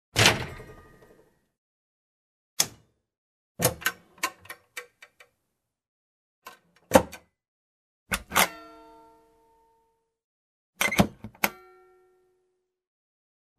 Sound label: cupboar door opens closes